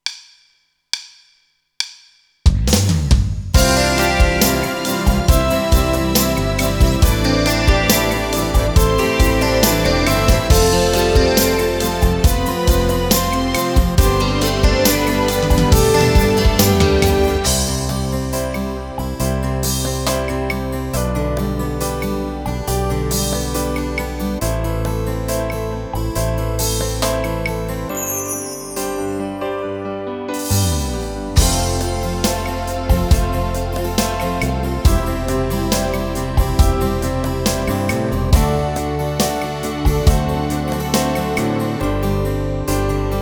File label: Rock Ballad